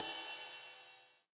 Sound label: Yamaha RX8, Edge